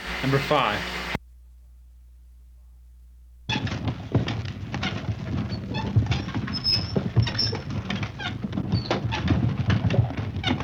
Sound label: Ox Cart